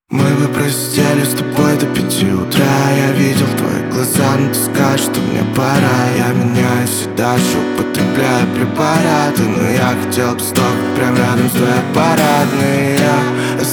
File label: acoustic version; russian